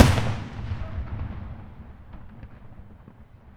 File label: FIRE KICK, Drums; Distored Reality 1&2